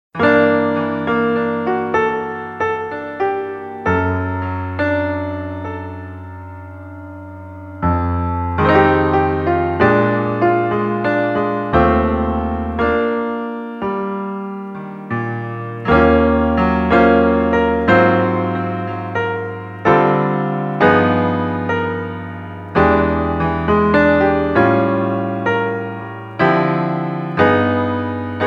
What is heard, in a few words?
Marine Jazz; Harvest Moon A Wonderful Life